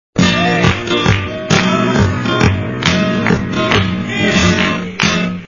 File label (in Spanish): buleria SLOW